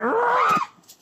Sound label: Soothing Tones